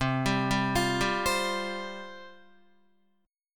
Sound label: C Suspended 4th C, Guitar; Csus4 arpeggiated; 7,10,7,10,7,8; JGuitar